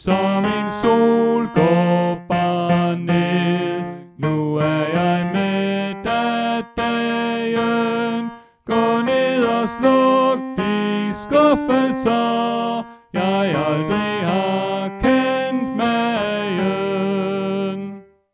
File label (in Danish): Tenor; oevefiler